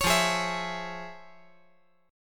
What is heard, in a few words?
F Minor Major 7th Sharp 5th, F